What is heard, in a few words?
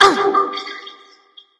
grunt hit light; smite; voicepack; hel